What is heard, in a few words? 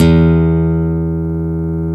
GTR NYL3 E, Nylon Chorus; Roland LCDP02 Guitar and Bass; Nylon String